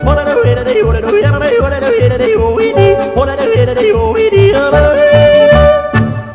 yodel

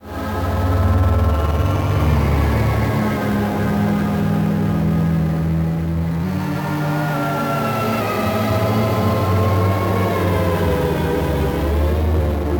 cold dream loop

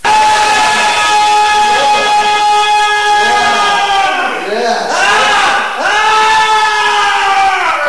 aaaaaaa

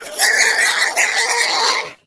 tushkano death

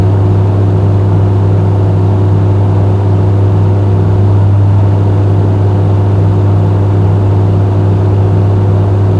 amb, ambience; caged

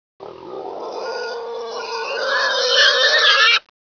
attack; houndeye

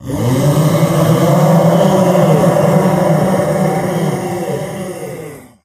controller presence r

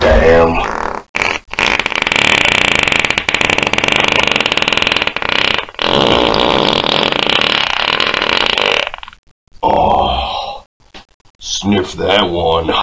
fart on dog

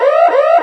alarm

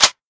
thumbs, wpn garand

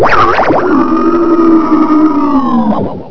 attack

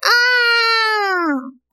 aah

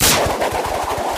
grapple start

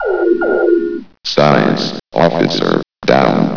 sci die

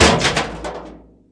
bust metal

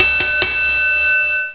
gong start

